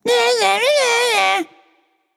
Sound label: tongue, voicepack; smite; jorm